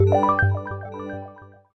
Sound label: Jingle Stage Clear; Super Rub 'a' Dub; PS3